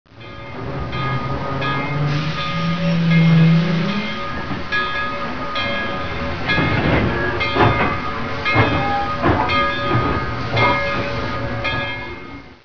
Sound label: BELL HISS